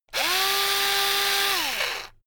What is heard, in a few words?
electric drill screw in long; maschinen; bohrer; soundpool